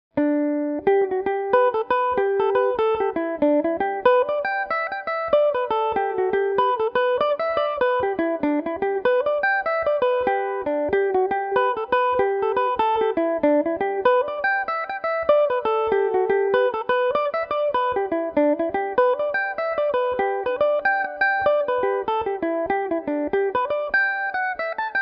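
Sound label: jig G major revelation, irish mandolin; kilmovee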